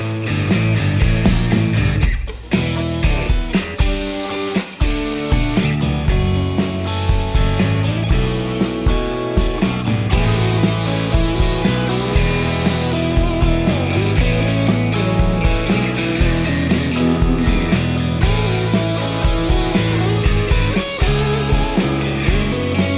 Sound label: solo lead